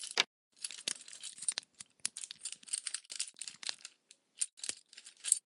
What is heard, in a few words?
Lockpick Player